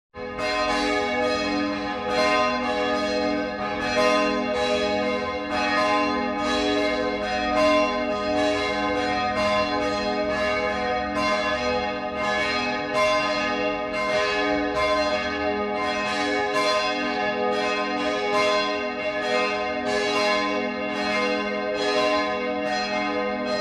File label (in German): glockenlaeuten; felben